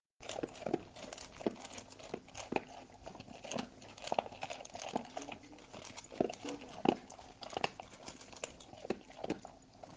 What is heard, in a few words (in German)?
Bulldogge beim Fressen